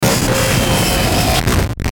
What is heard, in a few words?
Glitch Descend Crackle Burst; glitches; Blastwave